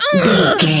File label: voice female hurt pain